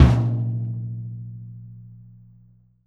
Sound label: TOM, JAZZDRUM; Ueberschall; Drum & Bass Flash Trackz